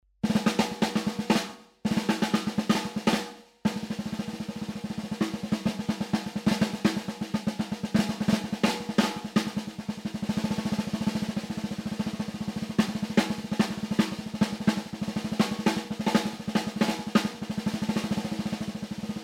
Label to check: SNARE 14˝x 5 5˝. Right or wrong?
Right